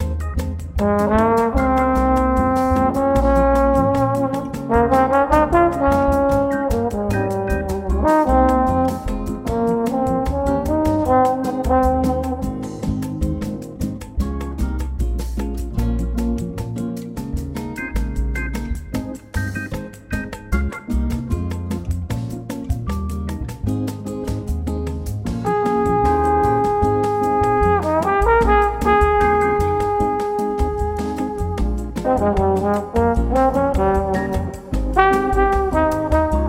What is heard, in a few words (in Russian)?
Мягкий Музыка для работы За работой